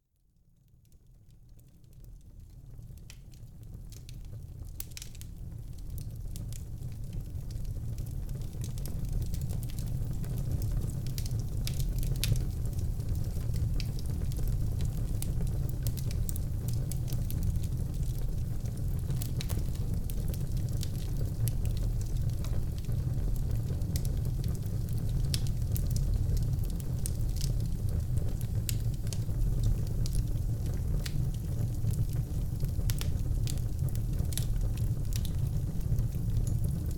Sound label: Relaxing Fire Sounds